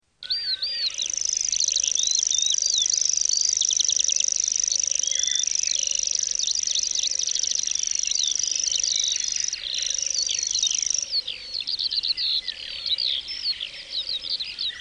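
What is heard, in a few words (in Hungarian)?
Réti tücsökmadár, Madarak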